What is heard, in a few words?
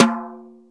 brush slap t, Jazz Brush